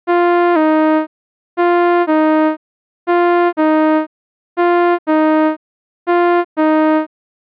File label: Envelope Spacing